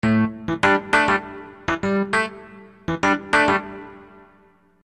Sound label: Da Phunk Rhodes; Construction Kit; HIP-HOP; Sonic Foundry (Sony Creative Software)